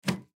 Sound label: Electrical Cord, Electrical Cord Unplug; Household; Miscellaneous Electronic